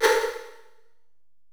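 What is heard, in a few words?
808 cymbal; Wizoo - Platinum 24 Electronic; 480L SP 808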